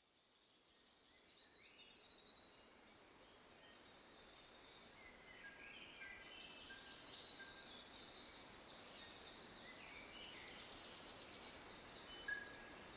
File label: forest audio